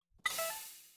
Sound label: coin collect